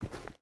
footstep rubber boots dirt walk; Rust

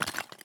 walk tile; Escape from Tarkov

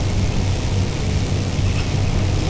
leo2a5 engine rpm 99; engines; War Thunder

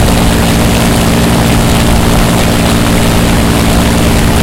me109 rear, engines; War Thunder

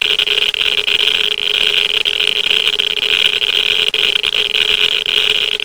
geiger ultra; Rust

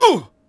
Hit Vox; Civ4 warlords

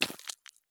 run gravel; Escape from Tarkov

